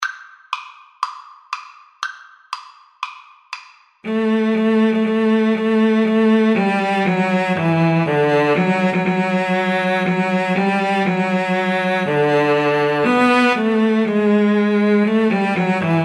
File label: wagner wedding march, Viola Cello Duet